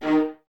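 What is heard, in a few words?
violas D#3 staccato, String Ensembles; Miroslav Vitous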